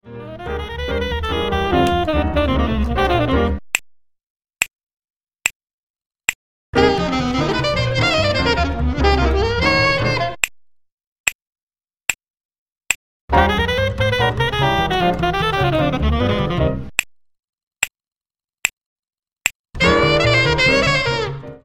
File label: minus drums snaps